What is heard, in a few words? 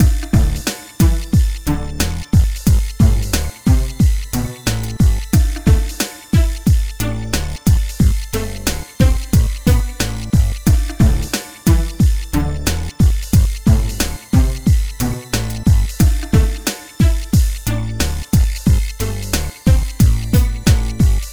Zero Hop, Hip-Hop; Sonic Foundry (Sony Creative Software); Hydroponic Hip-Hop; Combo